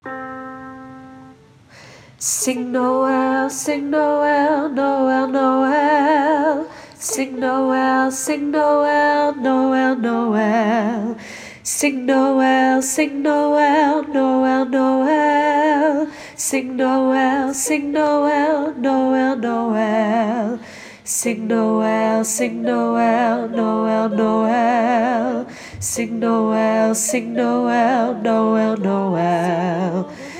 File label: African Noel Tenor Lead